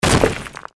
Wall Cracked; bob espo

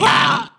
morf hit; DAoC

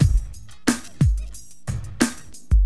DM LOOP, HipHop, Drums; LiveAct Pool

